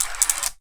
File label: Curtains, household rhythm samples Hits